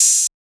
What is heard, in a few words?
cymbal chop, Hip-Hop; Drum One Shots; SD CAICOS Hip-Hop Sample Pack; Slate